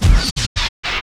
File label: maledict fail; witch doctor